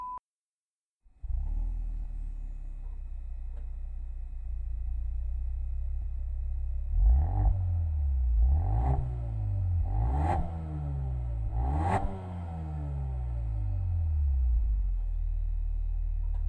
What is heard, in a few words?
Mercedes AMG A35 REMUS Axle Back System